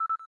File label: cam timer last; LG; X Cam K580